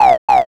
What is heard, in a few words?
crow